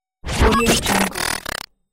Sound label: glitch